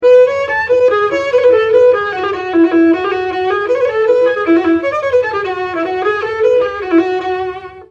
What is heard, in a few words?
lyra